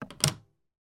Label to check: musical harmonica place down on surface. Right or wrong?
Right